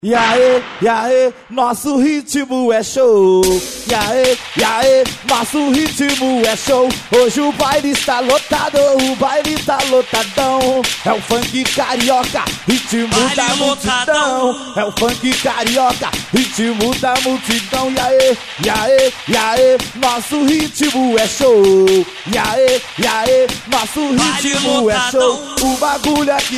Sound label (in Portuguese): ritmo show ao vivo